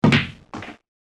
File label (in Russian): vylet shara